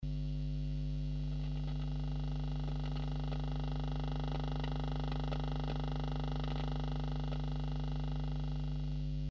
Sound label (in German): brumm reverb